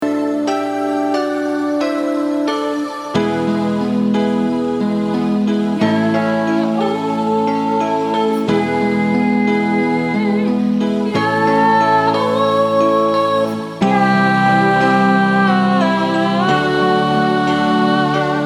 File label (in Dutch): oefenband alt